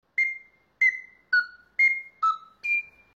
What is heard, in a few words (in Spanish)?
flauta